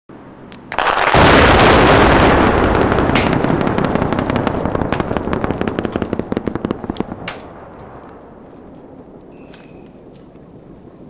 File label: bridge blast